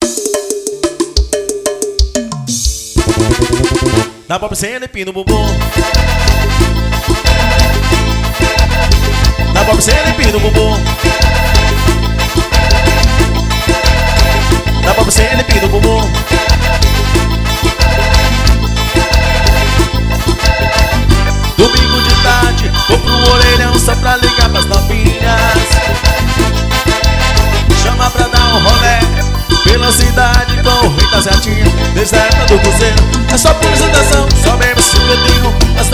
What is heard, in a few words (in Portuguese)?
forro swing